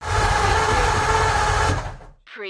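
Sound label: Classic Nt Turn Over, vehicle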